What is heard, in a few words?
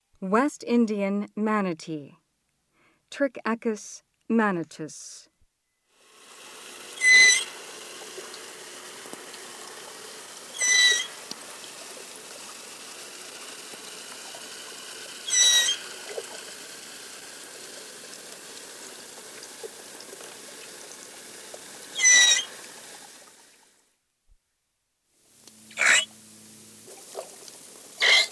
West Indian Manatee; S. America